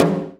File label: SMALL DRUM, JAPANESE PERCUSSION; AKAI S6000 CD-ROM - Volume 5; Ethic